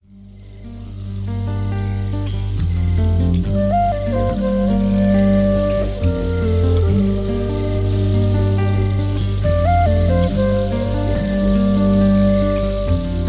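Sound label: didgeridoo forest; relaxed and exotic lounge; Didgie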